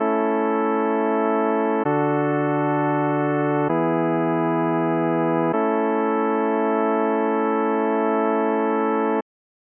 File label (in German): kadenz gleichstufig